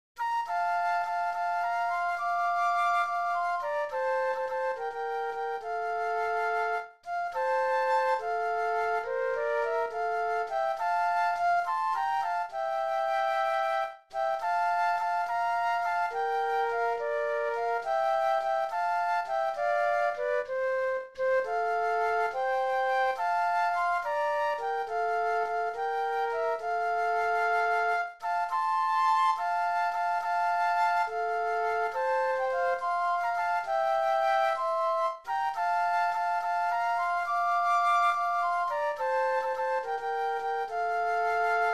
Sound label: Flute Duet